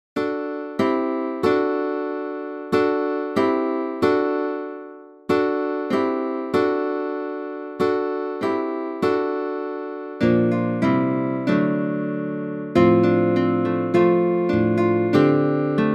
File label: guitar; Hymnal 1982